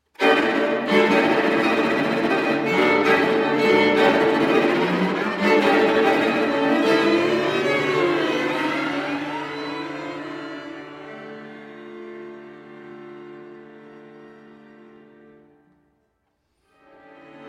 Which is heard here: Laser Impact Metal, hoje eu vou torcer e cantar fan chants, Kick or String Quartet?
String Quartet